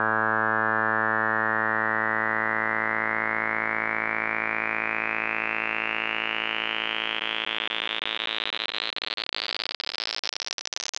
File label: pulsars masking